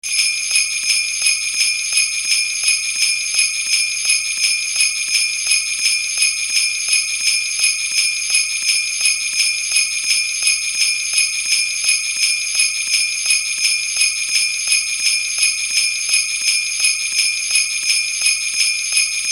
Clochette

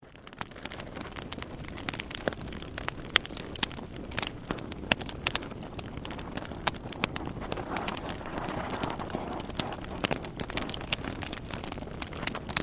Ambiance cheminée; bruitage

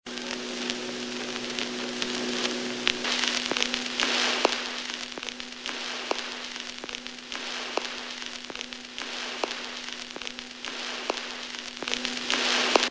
Vynil; bruitage; Electro-menager

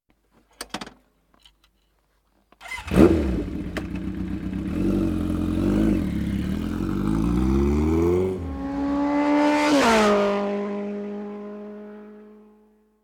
La moto

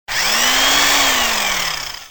Perceuse; bruitage; Outillages

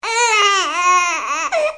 Bébé, bruitage; Bébés

